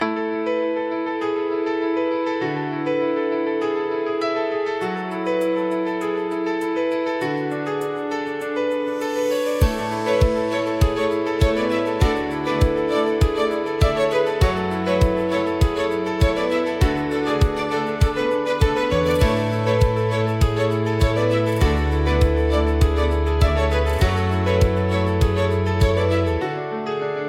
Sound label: Corporate motivational music; q4 focus